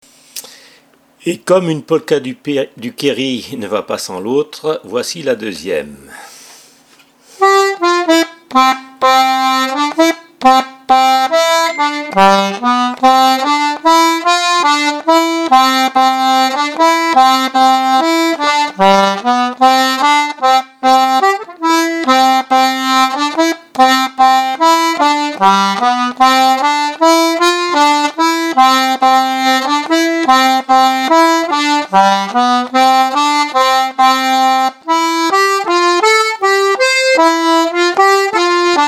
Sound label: Polka; Kerry